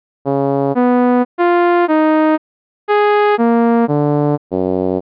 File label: Envelope Pause